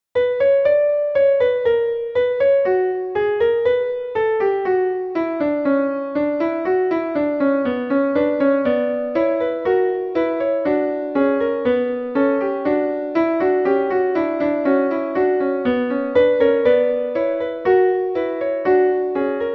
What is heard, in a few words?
Bouree Kanon